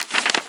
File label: Page Turn; Test Realm